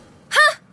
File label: hmmpf